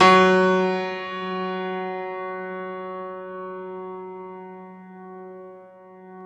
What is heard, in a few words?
Bosendorfer; Optical Media International - Sonic Images Library